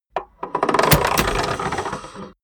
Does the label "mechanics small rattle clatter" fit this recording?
Yes